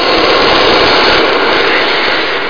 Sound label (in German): hagel; wintron